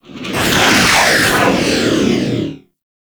Fighter Ship Close Short Fly By, Space Fighter Ship Engine; Warframe; RailJack; Enemy Ships; Corpus